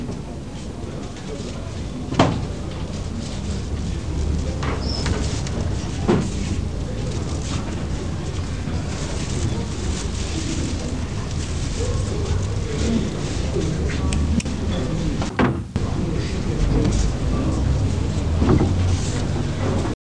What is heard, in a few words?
murmu; Villagina; Village